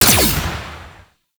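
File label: Igniter Pistol Projectile Explosion; Warframe; Weapons; Corpus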